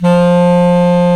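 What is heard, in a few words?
bass clarinet, Orchestral Winds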